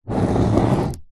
office pen ball point draw line short paper on plastic table